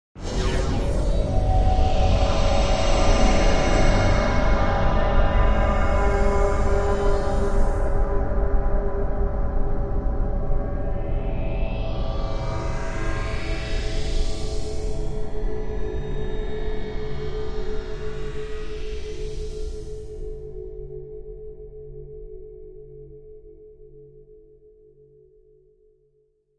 Stab Elongated Laser Fire Stab with Shimmers, Wash